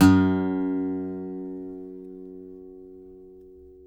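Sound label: acoustic guitar pick F#2; Country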